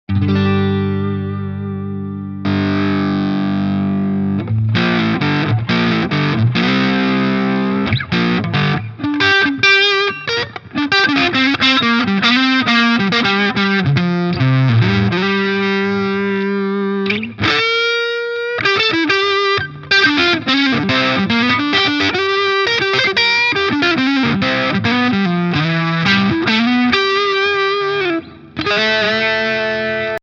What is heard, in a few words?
Earthquaker Devices White Light V2 Gain max Weight max Comp